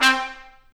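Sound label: brass trumpet stab; Roland L-CDX-03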